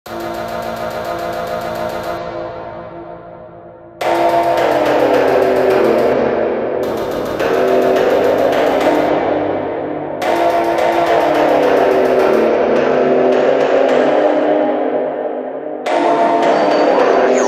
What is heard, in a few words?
phonk